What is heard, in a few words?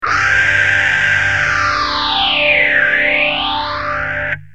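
guitar tc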